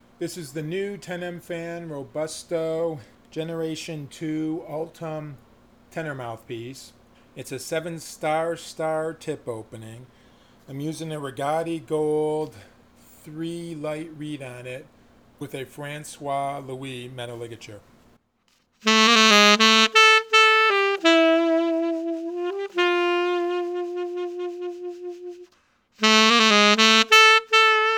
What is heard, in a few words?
Soft Ballad; Robusto Ultem